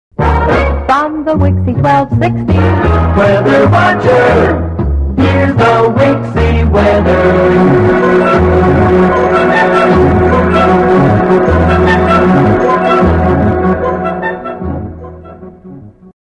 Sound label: Weather Marker